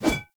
One Handed Axe Whoosh; Weapons; Enemy; Axes; Sinecure One Handed Axe

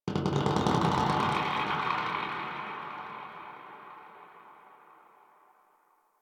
Exterior Space Rings Details Two; Ambience; Warframe; Railjack

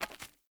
Walk Stone, Footsteps; Player; Mother

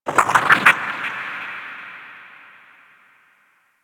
Cephalon Tech Texture Medium, Ambience

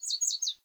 Sparrow Song; Animals; Vocalizations

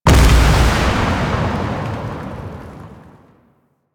Void Dragon Orb Proj Explosion; Enemies; Abilities; Warframe; Duviri

